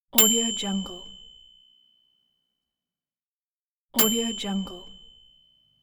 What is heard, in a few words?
bell ding